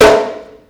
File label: gendang anak